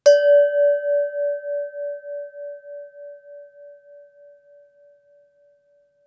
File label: Cow Bell Antique Large